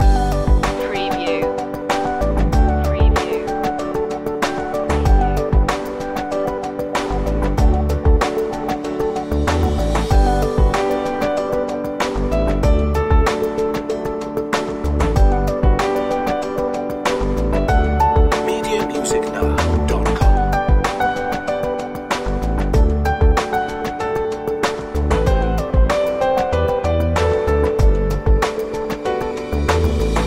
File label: friendly corporate theme loop